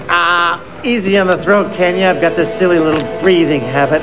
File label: throat; Dahak